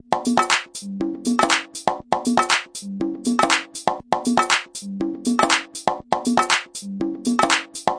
120 my conga luvs drum machines, DRUM LOOPS and BREAKS